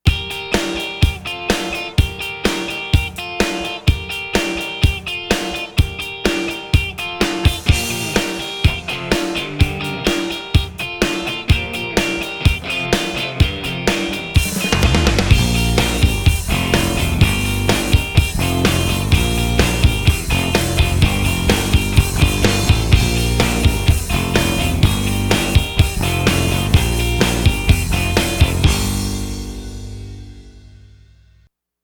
modern mid tempo rock

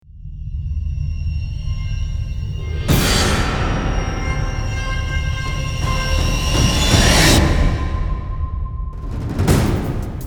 drums

